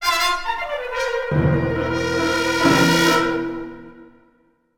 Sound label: Sting; Courtyard